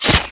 knife hit flesh

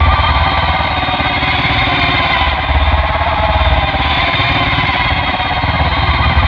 whine, apache; gearbox

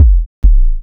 he blast, scream; svencoop; cityofthe dead

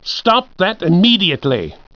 scared; svencoop

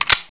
sniper mag in, weapons

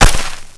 impact concrete, debris

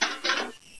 metal; svencoop; aomsounds

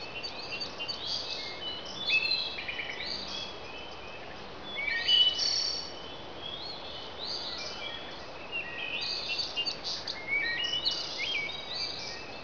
FOREST, jungle

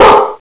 bark

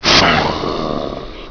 slave die; gearbox; aslave

